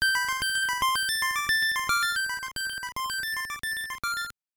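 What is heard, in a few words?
Jingle, video game; game boy wars turbo 1997 sgb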